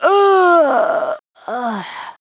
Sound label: DYING; BattleSNDS